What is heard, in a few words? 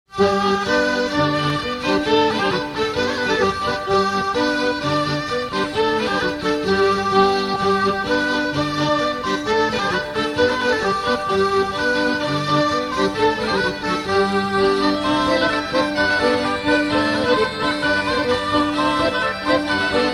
bourree bicorne